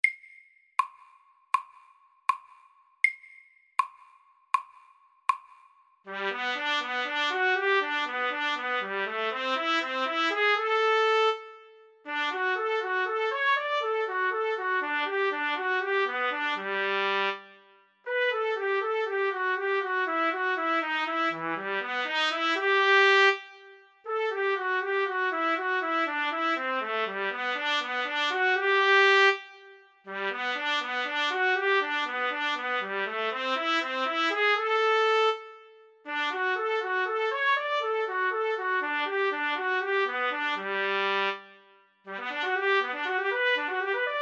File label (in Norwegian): Etyde i A dur FORT